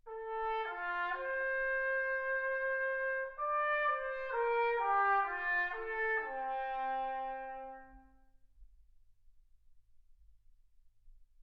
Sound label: trumpet